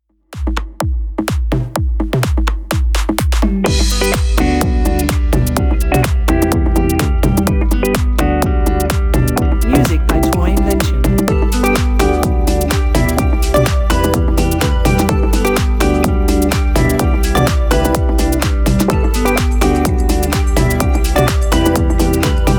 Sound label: Summer Radio Pop